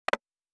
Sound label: UI Simple, Interface; Survival Game Sounds - Lite Edition